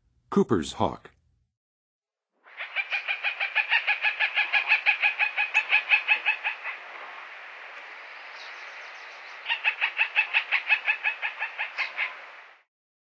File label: Coopers Hawk, Birds of North America; Western USA; Western USA Songbirds